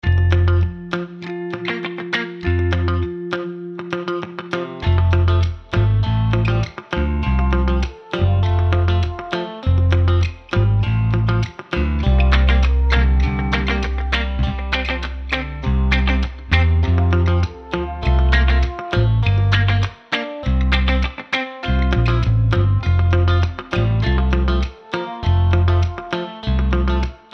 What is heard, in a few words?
reggae